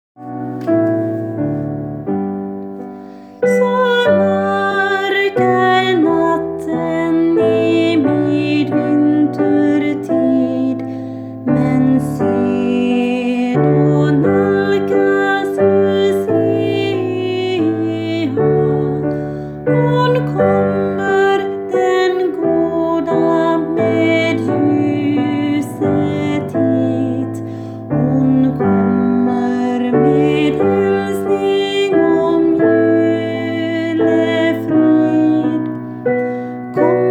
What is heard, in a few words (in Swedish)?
Lucia så mörk är natten A